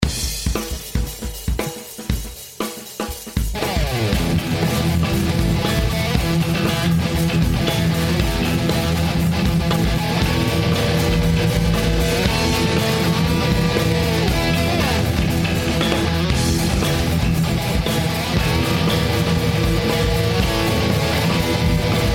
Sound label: Bass